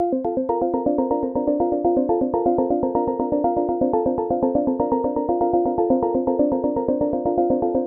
122 BPM Arps Liquid Arps A maj G min Tonic C; mmb studio melodic

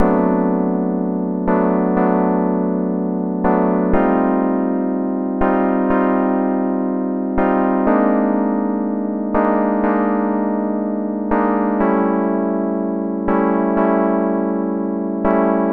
122 BPM Tonic D Amaj Gmin Chord Progress; mmb chord progressions essentials

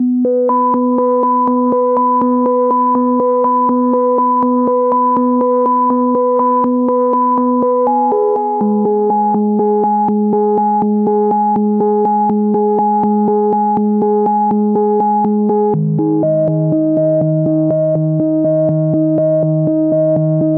122 BPM Melodic Arps A maj F min Tonic A; MMB

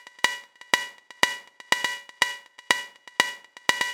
122 BPM Synth Perc; mmb drum arsenal vol 1

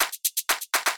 122 BPM Tops, percussion; Percussion Essentials 1; MMB

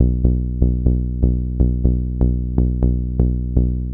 122 BPM Bass Synth A maj F min Tonic B; Pro Bass Synth Studio Kit; MMB

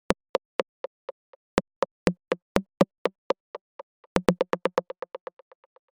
122 BPM Rims; percussion essentials 1

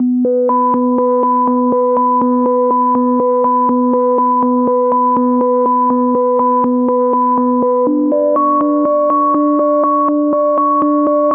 122 BPM Melodic Arps C maj A min Tonic A; MMB

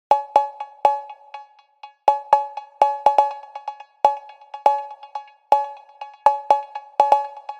122 BPM Cowbell; mmb drum arsenal vol 1

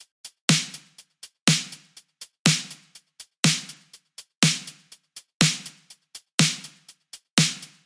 Battery Full Groove, 122 BPM; full drum kit; Alchemy

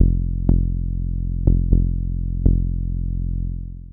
122 BPM Bass Synth A maj G min Tonic F; mmb studio melodic

